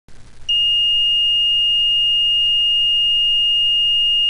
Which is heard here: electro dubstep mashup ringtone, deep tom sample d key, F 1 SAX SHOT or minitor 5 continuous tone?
minitor 5 continuous tone